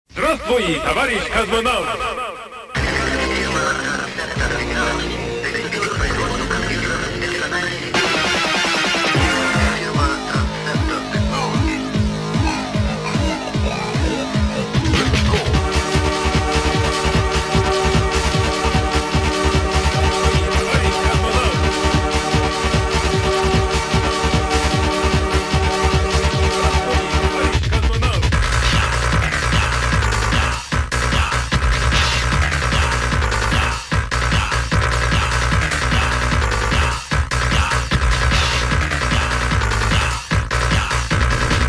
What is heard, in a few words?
Noise